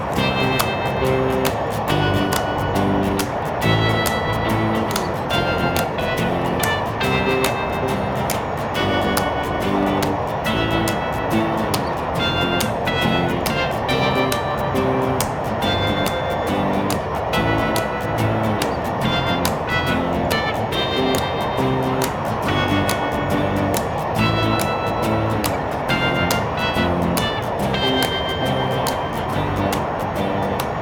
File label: music jetplane; TyTheTasmanianTiger3; XBOX